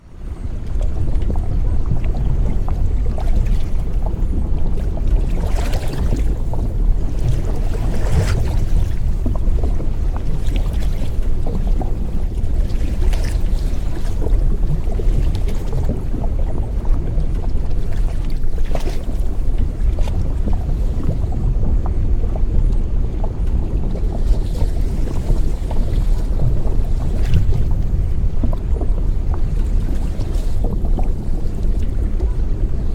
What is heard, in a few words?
Arkham Riverside Water